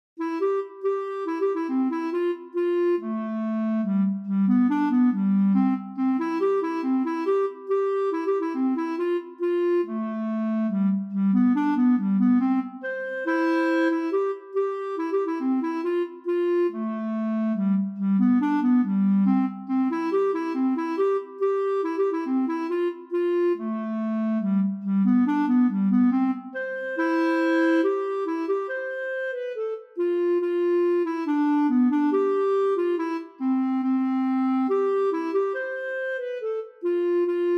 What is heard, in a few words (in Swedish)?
Shottis